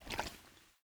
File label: bota bag slosh; Rust